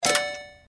feat button; Candy Bona

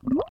Spin Button; Dolph Dive

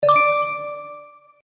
Wild, slots; Holy Goat

